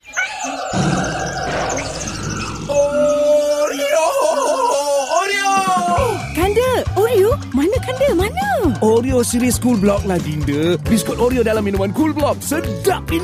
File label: music; Coolblog; Oreo